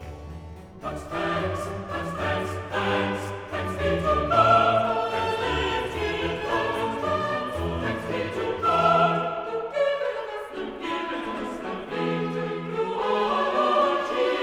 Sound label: Chorus; But Thanks be to God; Handel; Messiah